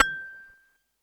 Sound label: ASI KOTO G5, Yamaha VP-1, ASIA-KOTO; Music Store; 90's Sample CDs